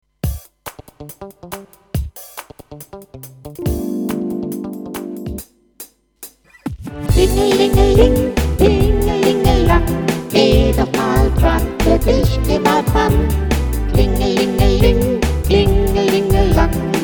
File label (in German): Familie Gesang